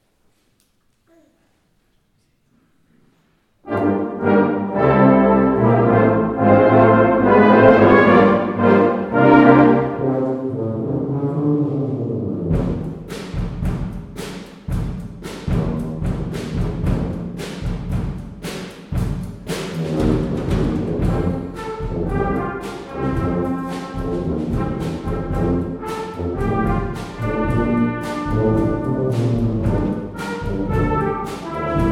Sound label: Jingle bells jazz, brass